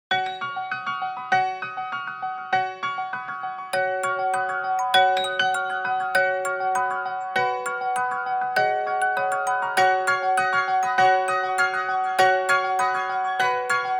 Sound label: Motorola Edge Plus Ringtone